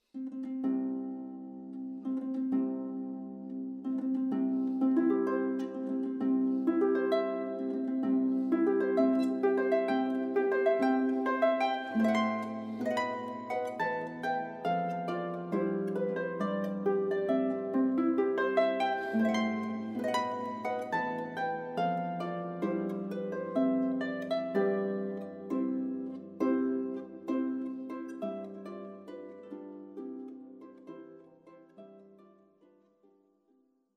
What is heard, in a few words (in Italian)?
Marcia nuziale arpa; Mendelssohn; matrimoni